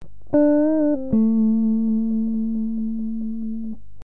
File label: bending, 12bar